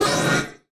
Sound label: Multimedia Internet CD-Rom Flash Pop Up